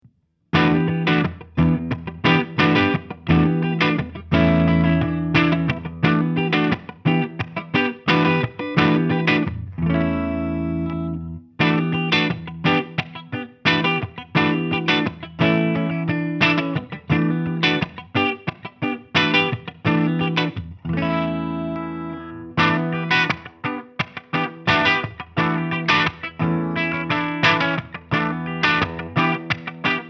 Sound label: Revv 100R Clean